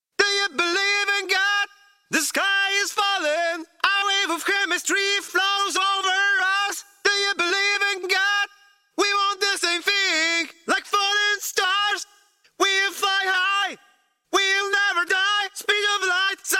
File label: drumnbass 140 G; acapella